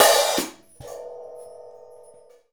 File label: Hi Hat Open with Chimes; One Shots; Essential Percussion